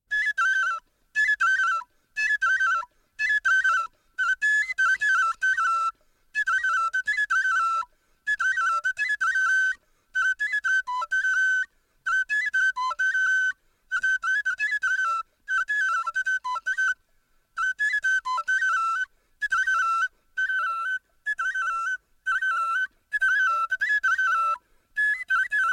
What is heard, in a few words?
Taru music Bariba and Somba Music